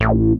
dance, bass; Techno Trance Essentials

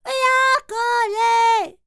Vocal Planet World Voices, NOMADIC

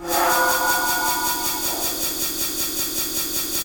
Machines; Dream Zone

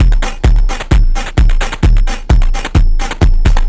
Crushed Beat 130BPM; musicradar

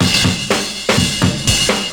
CYMB, Breakbeats

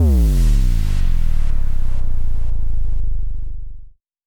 Subdrop F; FX; 99Sounds; Monosounds

